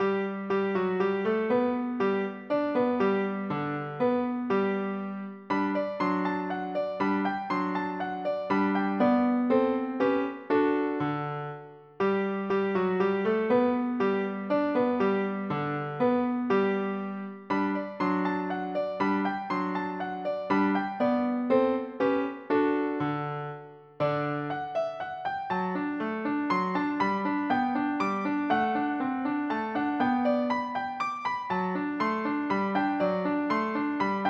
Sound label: minuet; MIDI CLASSIC; haydn